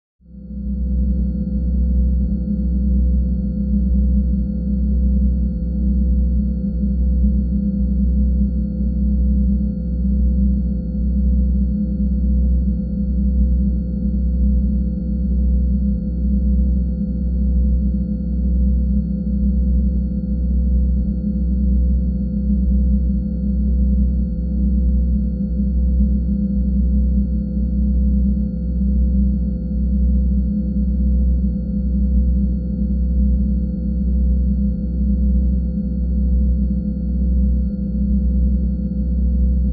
Space Ship Cntrl Rm

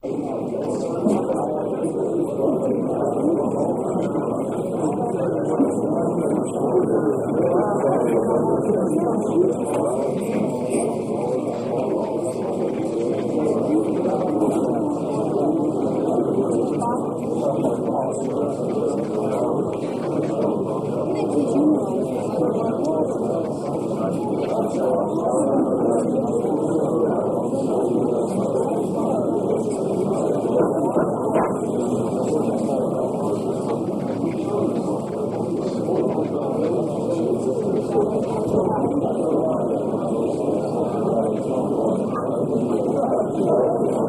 Bar Walla, Medium Crowd; bars & discos

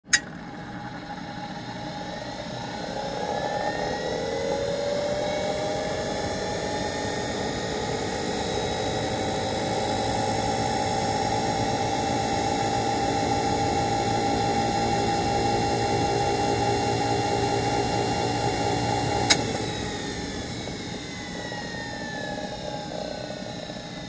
Hospital Laboratory, buzz

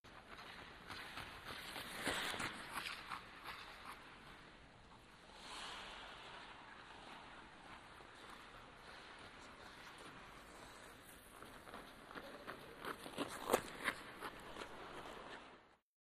Hockey Fast Skate By

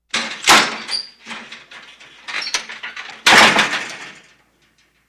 Metal Fence Slides, fences

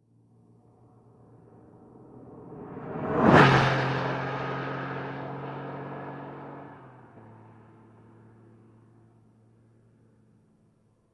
Car Sports Car Short V

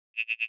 Triple Buzz Beep Hi

